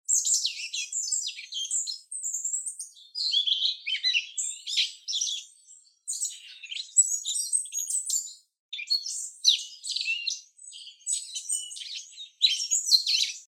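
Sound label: Asian brown flycatcher song, birds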